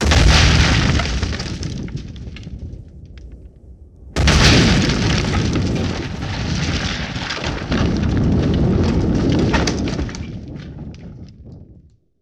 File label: Explosions with wood debris scattered